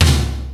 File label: RY-10, DRUMS + PERC, YAMAHA